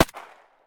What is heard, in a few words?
Shooting; DungeonShooting; 完善武器音效